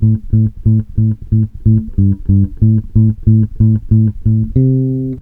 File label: RUSH A, Bass Grooves; USB Soundscan vol.30; AKAI